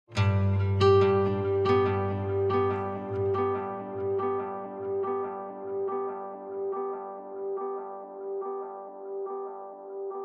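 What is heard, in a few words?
Ground Acoustic guitar Arpeggio; Ricosuite; Just Cause 3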